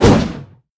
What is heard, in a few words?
whoosh